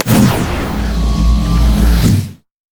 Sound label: item scroll of teleport; LoL